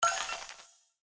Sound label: Bet Plus; goldenClover